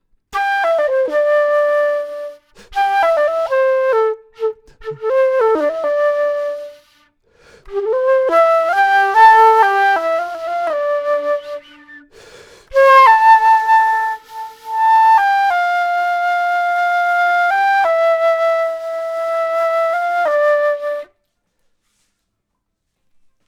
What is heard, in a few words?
flute; logic